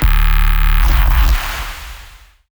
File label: Bass Shot; neuro; BIG5AUDIO